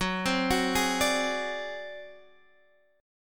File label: Ab 7th Flat 5th, chord